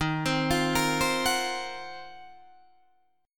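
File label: E flat Minor 6th Add 9th, Guitar, Open D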